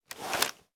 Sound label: deselect, gun; explodiumgun